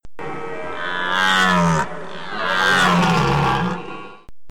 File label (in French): cerf